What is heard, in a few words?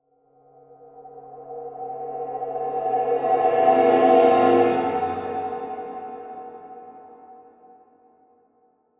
Musical Sweeper, Production, Element, Musical, Sweeper, Crescendo; Musical Sound Design; BlastWave